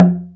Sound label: WOODTOM; Best Service Advanced Media Trax Modern Composer